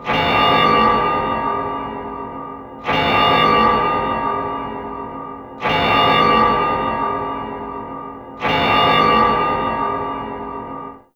Orbital Station Alarm Loop; Warframe; Tau

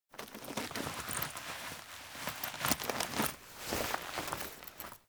Cloth Movement; Warframe; The New War; Drifter; Foley

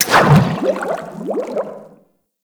Frogman Burst, Vehicles; Warframe; Grineer Frogman

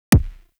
Weapon Punch RPG

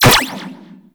Shot, Bladed Pistol; Akjagara Prime; Warframe; Weapons; Tenno

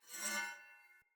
Dagger Whoosh Accent Heavy Long; Weapons; Player; Swords

